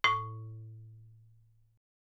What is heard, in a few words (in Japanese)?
マリンバ; 体鳴楽器; 打奏